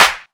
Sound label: clean clap single hit A key; percussion; single hits one shots